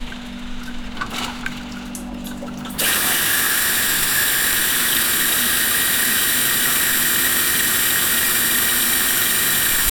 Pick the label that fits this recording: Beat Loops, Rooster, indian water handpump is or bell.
indian water handpump is